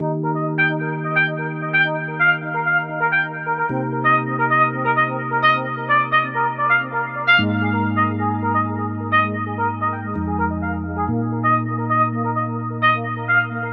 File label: fresh morning ringtone